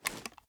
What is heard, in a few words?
reload empty fast rattle; reloads; iw8; uzulu; gmod